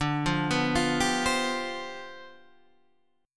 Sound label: C Major 7th Suspended 2nd Suspended 4th D, chord